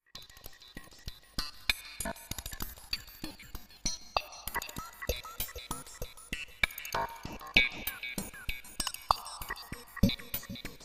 Clicky Percussion, RS 370 Polyphonic harmonic generator